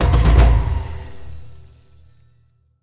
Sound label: win big win loop end; rise of maya